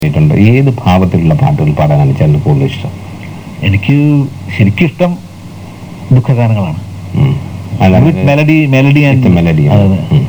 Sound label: melody